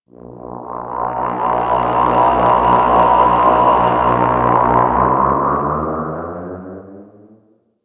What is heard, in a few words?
exterior small ship passby; Warframe; Corpus Railjack; Ambience